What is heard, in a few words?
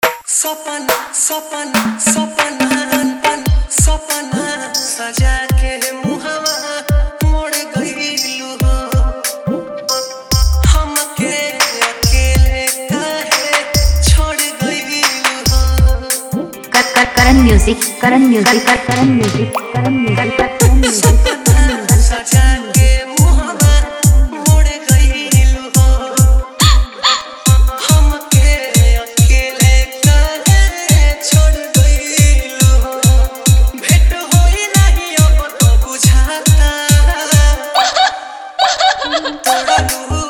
Bhojpuri Sad Song Jhan Jhan Bass Dholki Bass Mix; Dj Karan Music